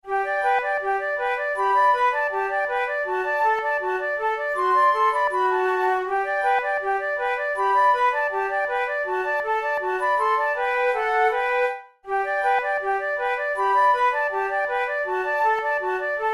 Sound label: duet, flute; go forget me